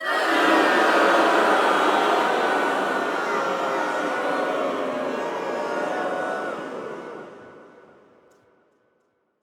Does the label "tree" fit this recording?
No